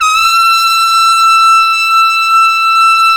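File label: brass, Tpt F, Solo Ambient; Roland L-CD702; Tpt 1-4 Solo; Tp 3 Ambient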